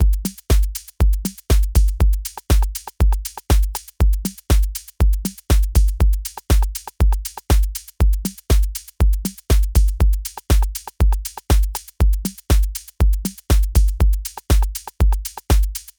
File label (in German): Analoger Drumcomputer